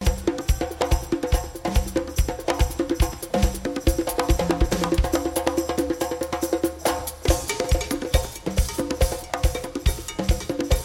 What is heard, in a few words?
Drums